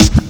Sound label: SD, Dance Mega Drums; Best Service